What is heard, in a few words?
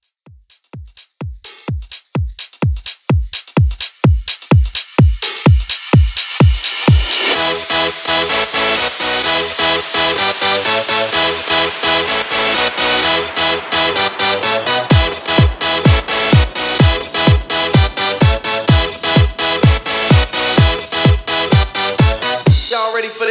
127Bpm; Redrum; remixplanet